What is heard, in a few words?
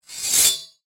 Light Sword Scrape